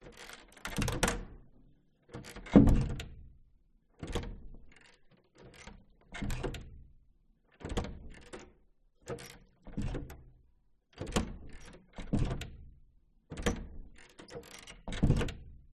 Door Abandoned House Hallway Perspective Reverberating Old Wooden Door Open; Skyes Audio